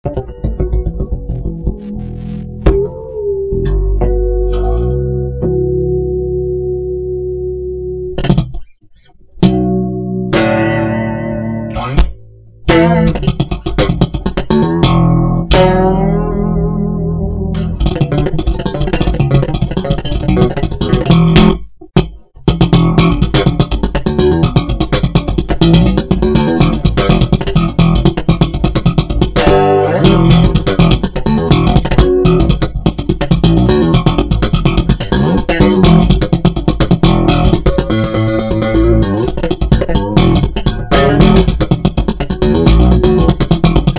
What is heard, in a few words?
bass riff